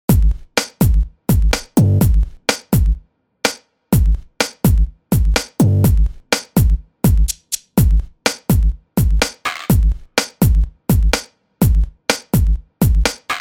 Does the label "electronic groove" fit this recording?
Yes